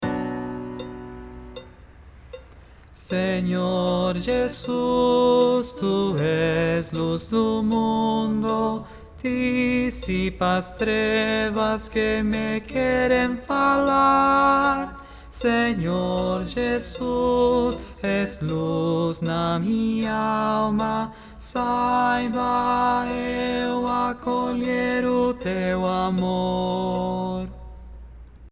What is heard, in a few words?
tenor